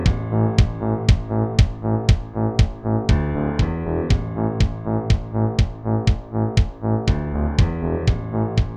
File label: jawharp